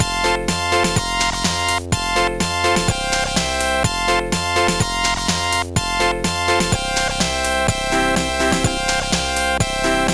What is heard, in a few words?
keyboard beat, trackers; Modland